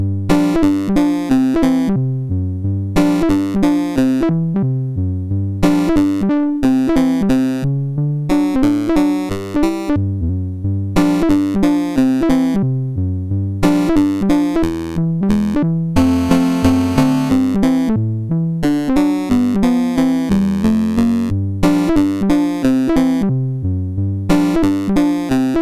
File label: Ragtime, MOS6581R4